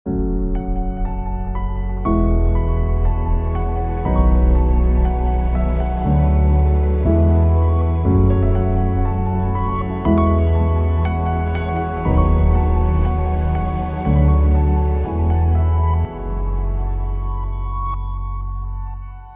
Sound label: Travel Logos North Spring Piano Melody Synth Pads Thoughtful Reflective; Unrealsfx